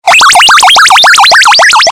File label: crazy ring alarm